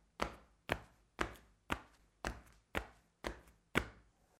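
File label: footsteps sound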